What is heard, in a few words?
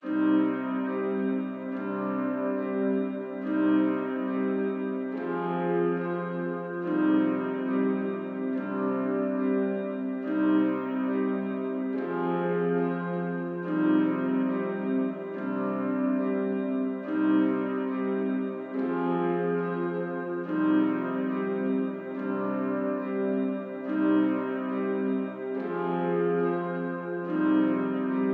141 BPM C Min Piano; Cymatics; Red Eyes; 2022 Melody Collection; Drill